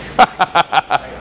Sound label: haha